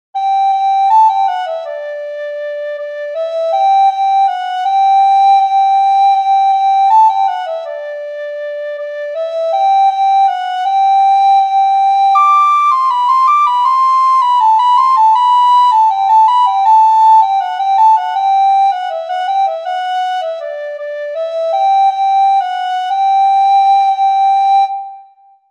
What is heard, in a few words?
ding dong soprano recorder; christmas